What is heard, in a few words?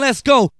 VOX SHORTS; Megabass Remix! 2